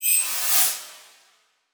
Transition Sweep Laser; Production Element; Title Transition; AdobeCC

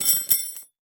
Metal Stakes Dropping On Dirt; Sports Stake

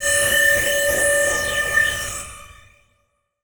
Transition Feedback Electronic; Production Element

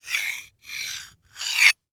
Industry Glass Cutting Three Times

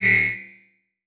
Digital; Adobe; Multimedia Internet CD-Rom Flash

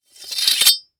Weapon Sword Remove From Sheath; AdobeCC

